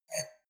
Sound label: wine glass grab table slide medium; berufe; gastronomie; soundpool